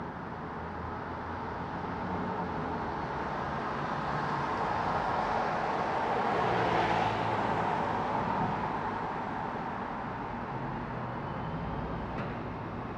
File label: slow city traffic ambience